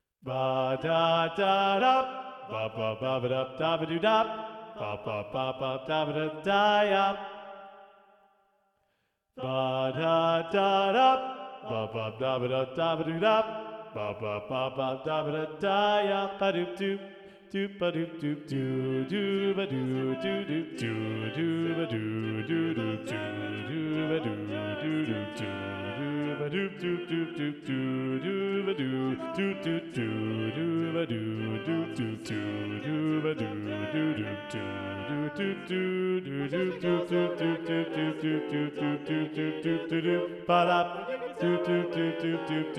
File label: Bass; Practice Room